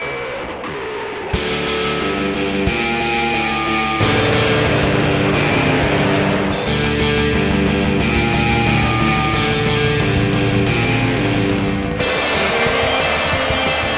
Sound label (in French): Guitare